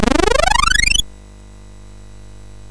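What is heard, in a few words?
Nutcraka, MOS 6581 SID chip music, games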